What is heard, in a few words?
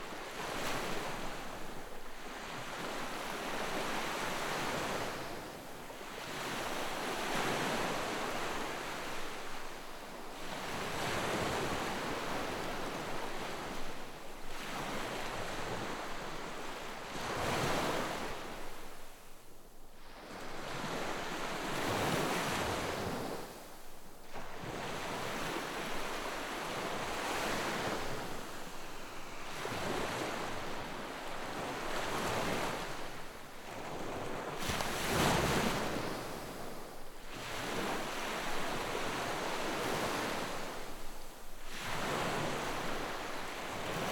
sea waves; jurkalne